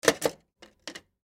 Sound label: Scissors Drop Into Container Pen Cup; Office; Blastwave